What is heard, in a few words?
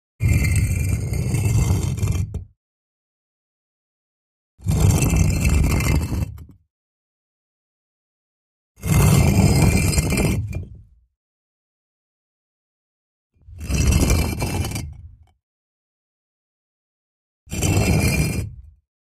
Stone, Heavy Brick Move, Push, Long x5